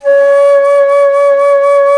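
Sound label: flute A; Miroslav Vitous - Solo Instruments VOL-2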